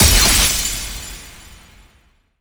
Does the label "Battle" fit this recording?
Yes